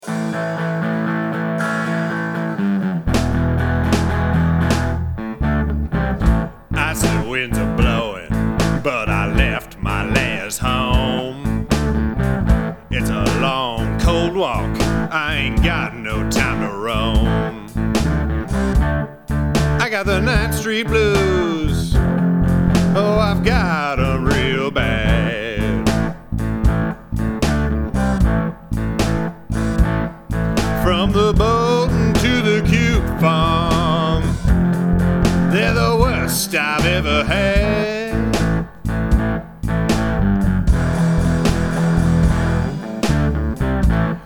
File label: blues